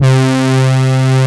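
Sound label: ANALOG BRASS C2; Best Service Advanced Media Trax Modern Composer; BRASS MIX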